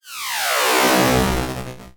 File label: science fiction teleportation arrive; zapsplat